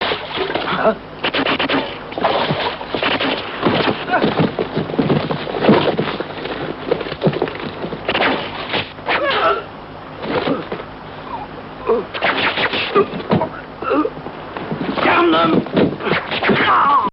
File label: arrows arrgh